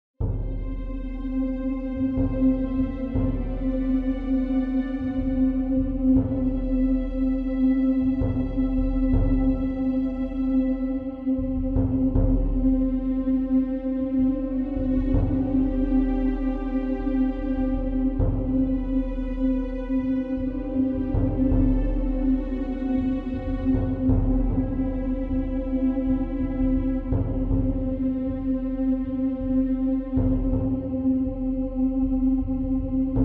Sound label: Texture Highland Disturbed Nostalgic; LYN115 Trailer Production Elements